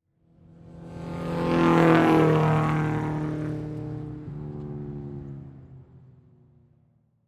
Transportation Car Race Car Drive By Near Pit With Downshift